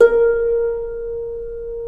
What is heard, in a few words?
Harp Bb3; Digital Sound Factory Virtuoso